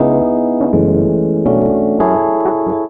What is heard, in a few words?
170, E, Keyboards; Total Drum&Bass; 90 s Sample CDs